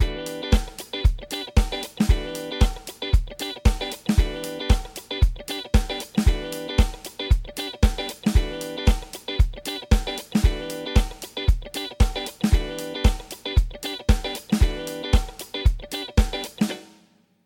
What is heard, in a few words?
The chorus guitar loop; 迪斯科; 如何制作迪斯科（disco）音乐